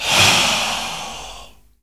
WHISPER OH; Spectrasonics; Heart of Africa 1; WHISPERS

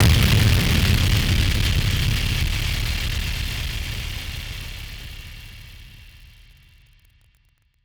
Drum Bomb; musicradar; bomb factory; Drum Bombs

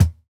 Kick, jungle breaks drum sounds

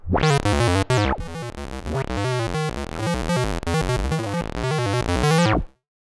Odd Modular; radiosonics

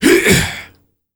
HIEHH, World Voices, Afro noise; ILIO; Vocal Planet VOL-5

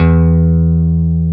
Jazz Guitar E2; 90's Sample CDs; Club-50 - Foundations Roland; Jaz-Emperor

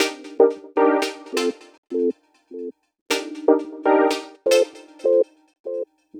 Synth Juice; Sonic Foundry (Sony Creative Software); Knutrix Drum & Bass

